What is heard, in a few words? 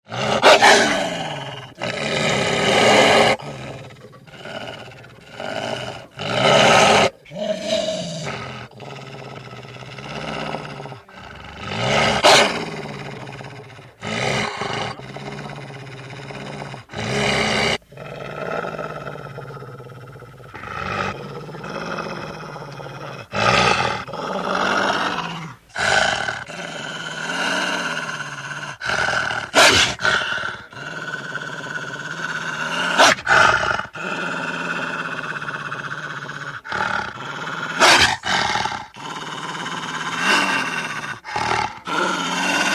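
volk golos, wolf voice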